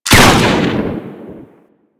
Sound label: Carrier Sent Shotgun Prime Fire, weapons; DE; Warframe; Sentinel